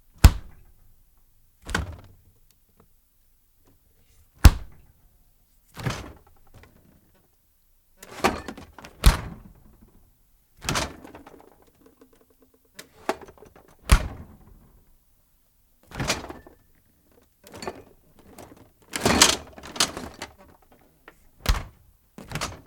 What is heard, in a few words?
Refrigerator Door Opening And Closing No Hum